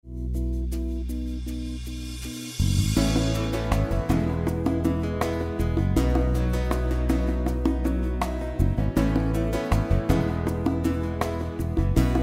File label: Karaoke